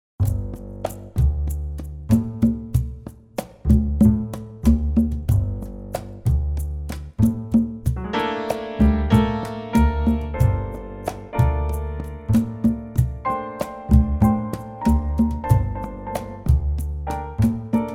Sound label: flute, Gm